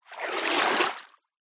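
nature water movement splash processed for stereo width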